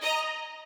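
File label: violin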